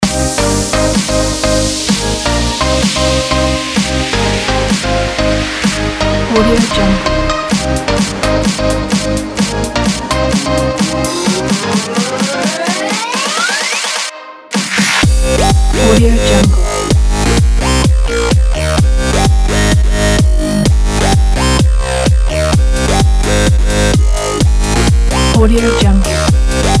piano dance

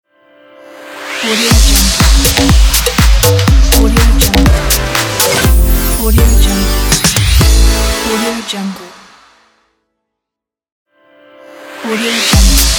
dance promo opener logo

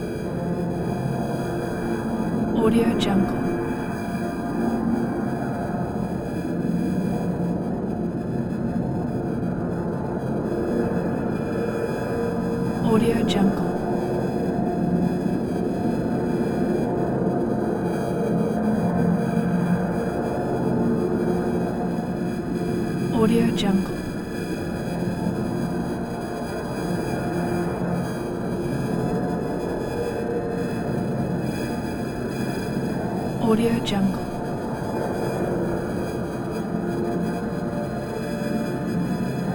windy alien voltage

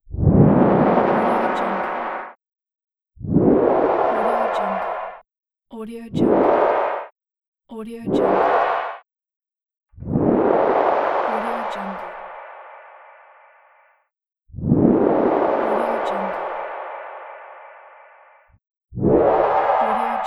space whoosh; avrmusic

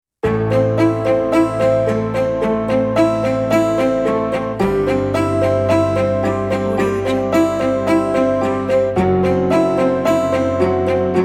intense drama soundtrack

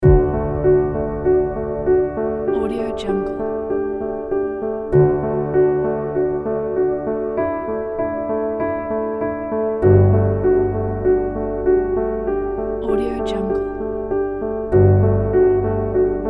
inspiring background piano; dynamic sound

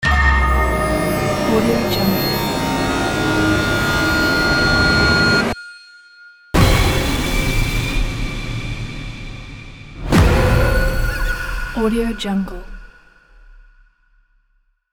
scary horror logo

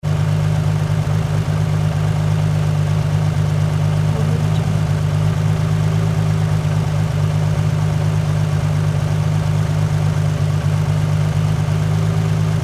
nissan 350z open hood idle

